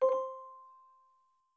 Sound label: new notification